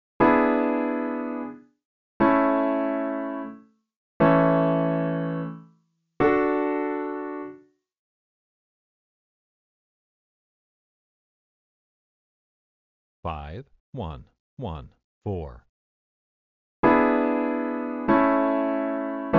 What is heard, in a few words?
I, IV, V7 (hard); progressions